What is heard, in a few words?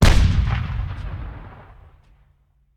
Artillery Cannon Artillery; Sound Ideas; Guns